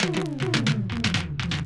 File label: LOOP, ROLLS; X-Static Goldmine 2